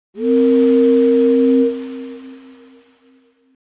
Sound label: horn